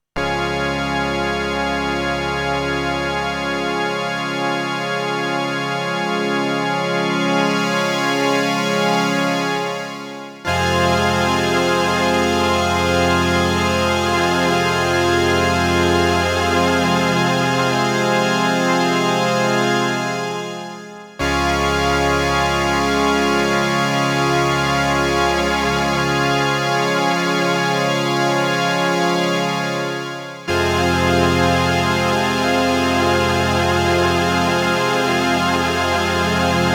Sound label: ppg wave organ